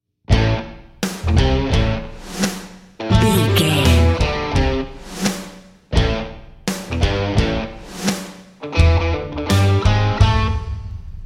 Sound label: dad rock narrative